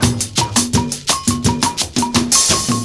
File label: PERCS, 70 s Breakbeats